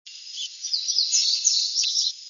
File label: bunting indigo; birdsongs